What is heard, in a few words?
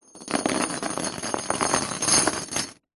industrial chain med movement on wood panel; zapsplat